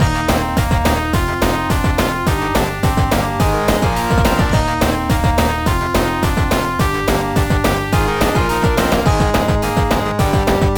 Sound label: Forest Boss Loop; ravva and the phantom library